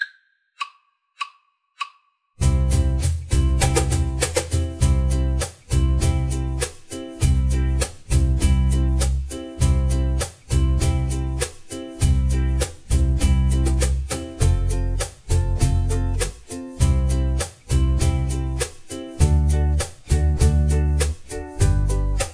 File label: jingle bells